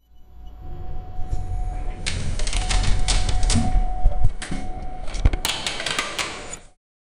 inter dimensional portal

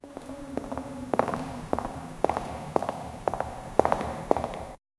a person walking in an; atmospheric

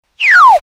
gun shoot cartoon sound

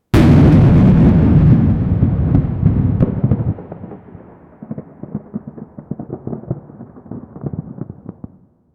cinematic soundscape, superhero

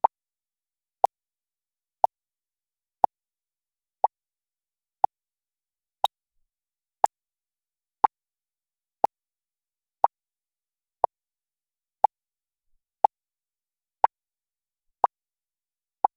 background neon light hum; blinking

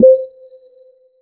soft satisfying button cl; button click

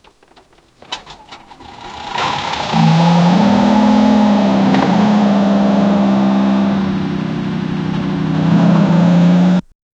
old 1958 vintage truck, cranking

